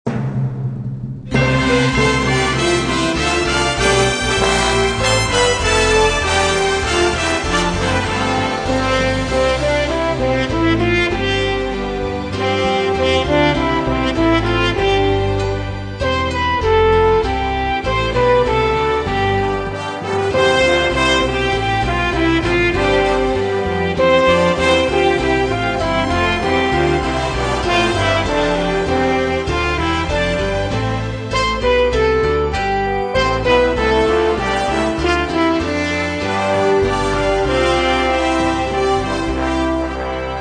Instrumental Hymns

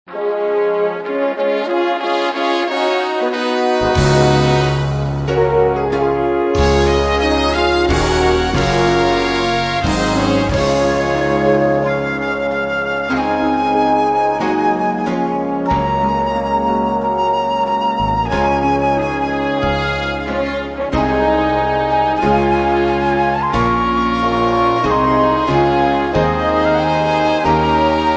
Instrumental Hymns

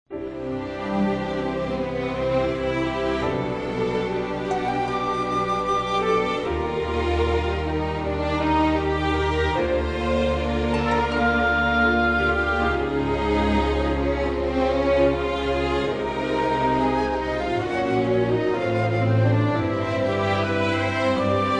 Singers And Orchestra

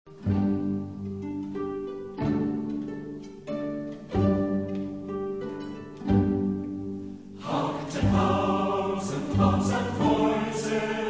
Orchestra and Choir

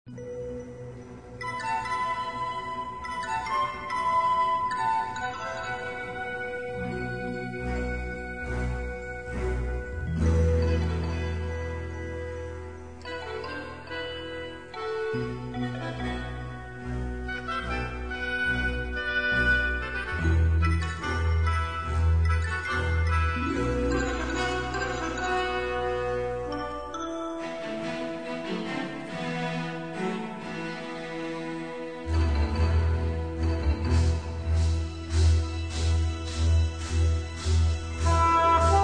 Strings, Christmas

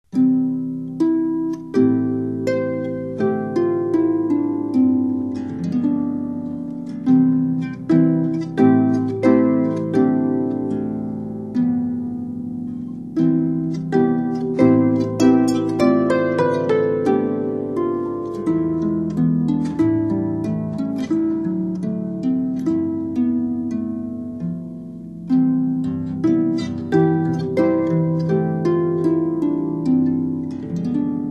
Harp

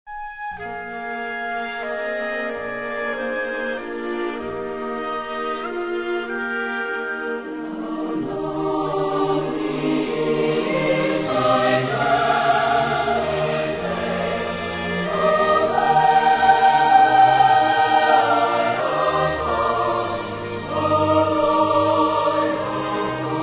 Choir And Orchestra

